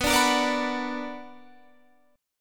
B Minor Major 7th, Guitar, Open G

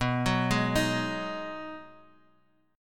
B 6th B down Guitar Drop D

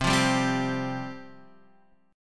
C Suspended 4th C, Guitar, Half Step Down; Csus4

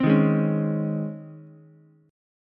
Db Major 7th Suspended 2nd Db Ukulele; DbM7sus2 strummed; JGuitar

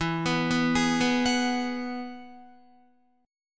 E Major Add 9th, Guitar, down; arpeggiated; JGuitar

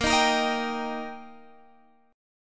G Minor Sharp 5th A sharp, chord

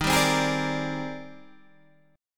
G sharp Major Sharp 11th, guitar

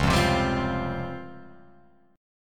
Db Major Sharp 11th down Guitar Open F; strummed; JGuitar

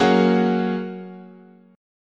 F sharp Major Add 9th, guitar chord

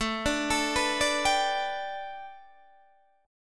A 7th Suspended 2nd Suspended 4th Guitar Open D

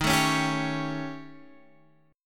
Eb 7th Flat 5th, Eb; x,x,x,8,9,8,9,10; Eb7b5 strummed; JGuitar

